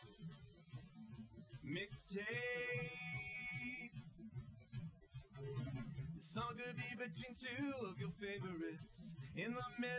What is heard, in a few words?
wdrums live rec; SiSEC08; SiSEC underdetermined